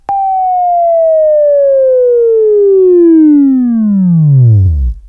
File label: Buzz